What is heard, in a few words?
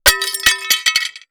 Impact Ceramic Plate Falls On Cement